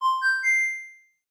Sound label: Charge State Notify; Fujitsu F-42A